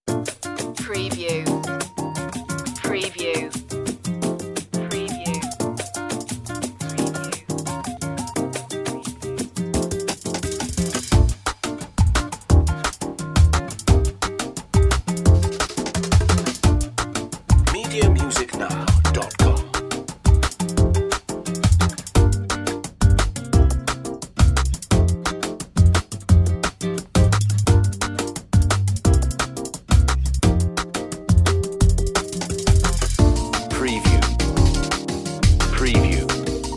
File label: drum and bossa